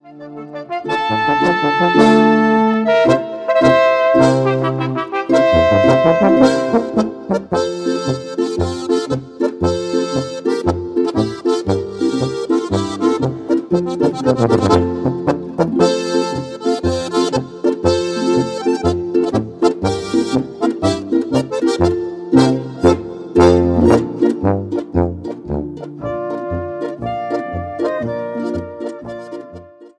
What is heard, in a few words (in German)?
Bachhaeusl Polka